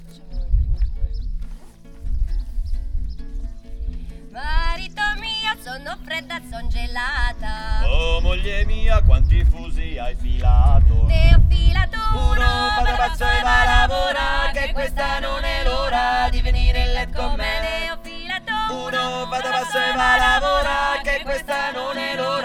trio, Chansons; Marito Mio; Pellafol

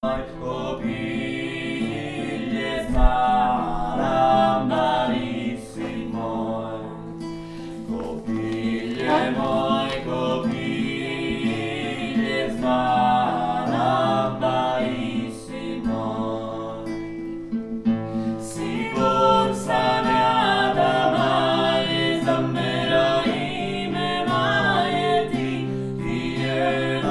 duo, Chansons; Kopile moj kopile

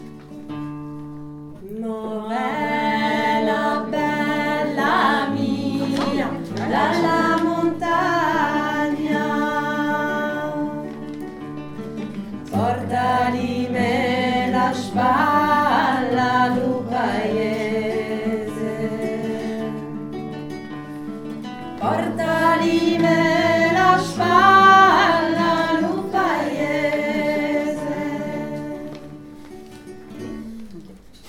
couplet haut, Chansons; Sarrazineurs; Mo ve la bella mia

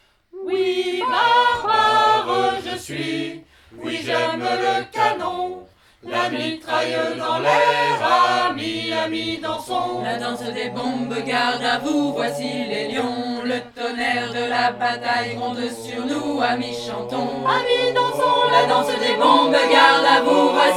chorale

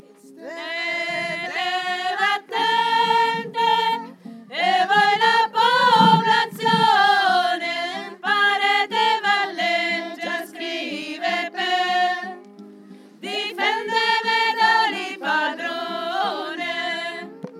sopranes refrain; Ganges

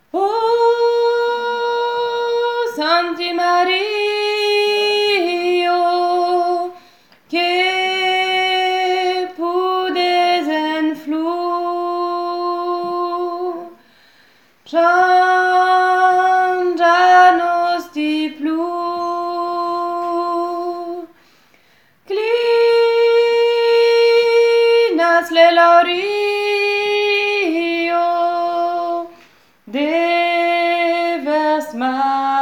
solo haute

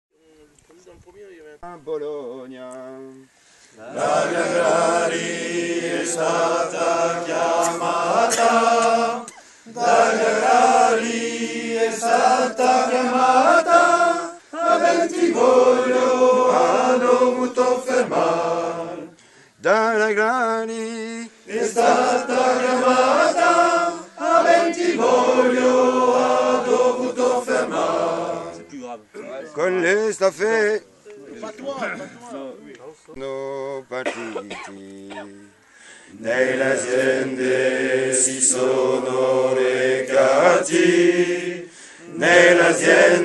basse only, voix

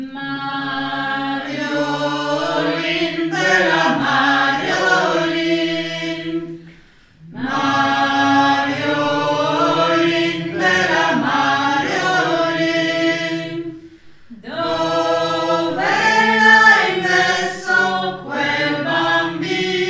Mariolin sopranes; St Jean

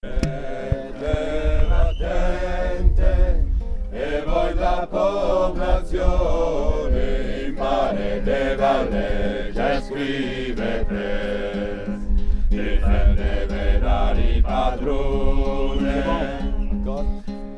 basses refrain; Ganges

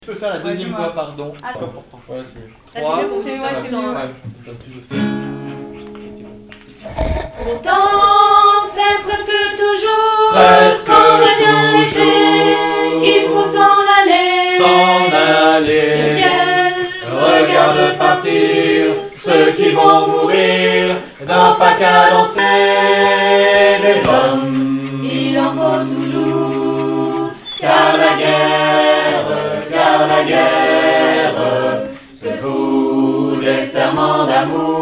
basses

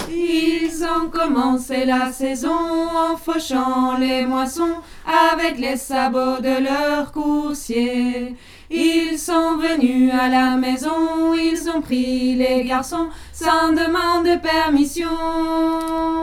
alti, voix; Archers du roi

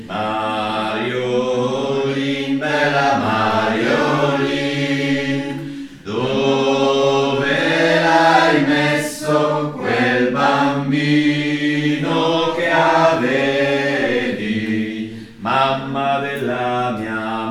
contralti tenors; Mariolin; St Jean

